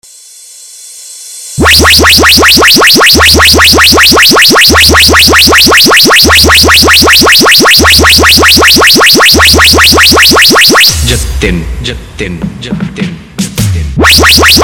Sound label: water beat dj competition beat